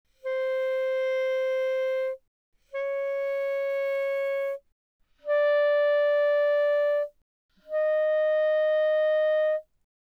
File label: Eb Clarinet mf C5 B5, Woodwinds